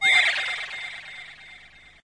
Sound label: alpha fx echo, Electro FX; Zico Alpha Electro FX